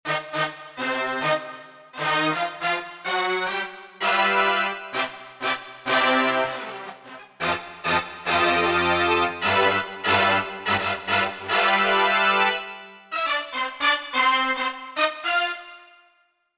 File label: Brass Sect, Roland JV-880; Factory Patches